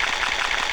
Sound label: Water Burst; Oversoul